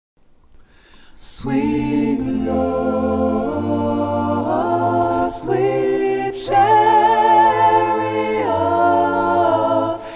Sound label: intro alt, Gospel; swing low